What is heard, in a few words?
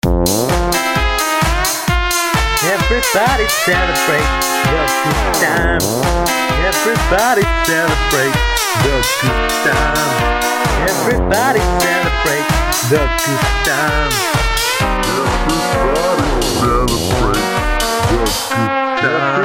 feel good happy song